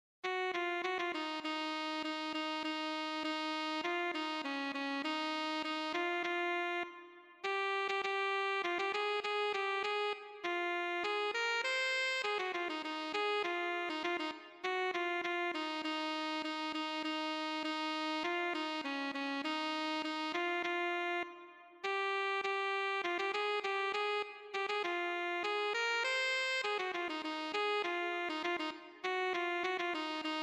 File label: alto saxophone